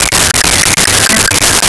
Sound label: TB303 seq; BGM; Nintendo; July 2020 Gigaleak; DrMarioSound